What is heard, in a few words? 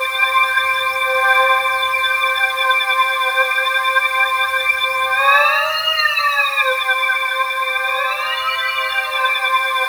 C5, Synth Leads